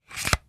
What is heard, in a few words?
Military radar antenna extending; military equipment sound effects